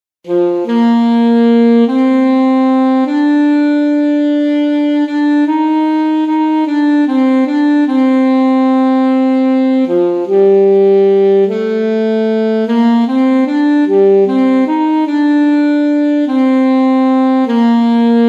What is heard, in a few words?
alto saxophone; plaisir d'amour